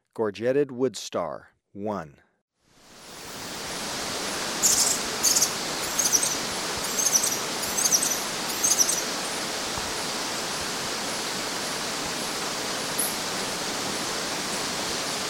Gorgeted Woodstar, Birds of Ecuador; S. America

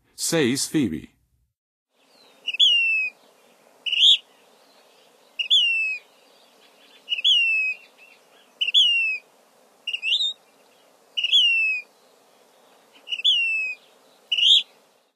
Says Phoebe, Songs of Birds; North America; Western USA; Western USA Songbirds

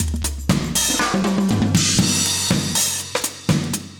120bpm loops; Sound Effect Library

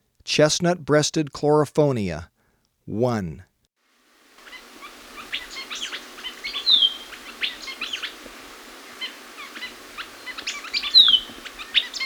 Chestnut-Breasted Chlorophonia, Birds of Ecuador; S. America

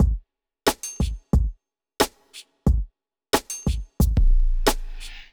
bellringer 90

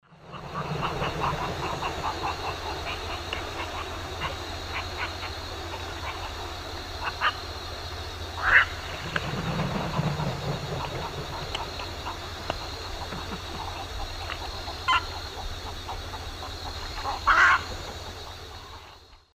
Mycteria americana, calls; Eastern Lowlands

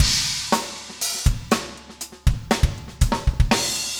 funk, 120bpm, loops

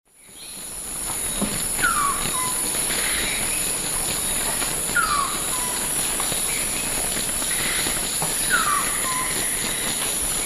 Piaya minuta, song; S. America; Eastern Lowlands

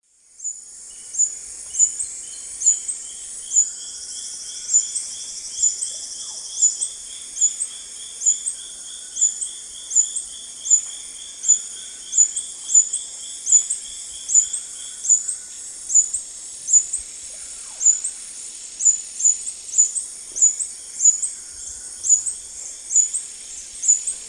Phaethornis malaris, song at lek; S. America; Eastern Lowlands

